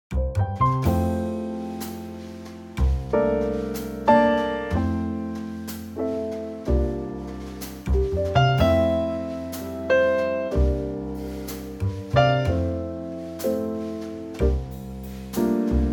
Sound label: Trio F